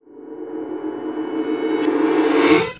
Eerie Hallway, Cinematic Metal Impacts; RVRS

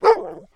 Dog Bark; Blind Drive

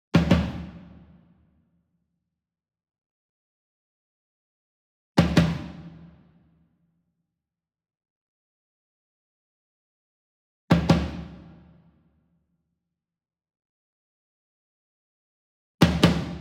SINGLE DRUM Tom Concert Double Soft Beater Medium; boom library; Cinematic Strikes Construction Kit

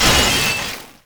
Shatter Ice Block; Destruction

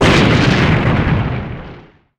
Explo Medium; SFX Kit; Destruction

